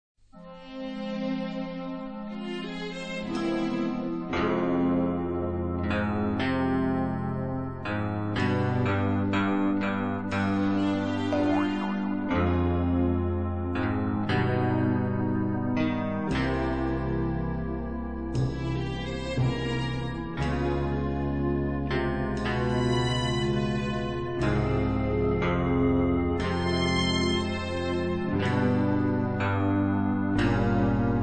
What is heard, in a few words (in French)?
Musique d'attente